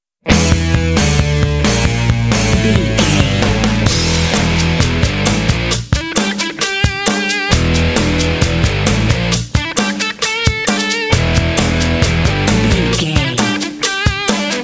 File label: full mix